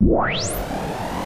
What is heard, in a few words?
raygun; rayguns